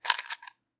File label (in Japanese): goisi